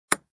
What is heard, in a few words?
boing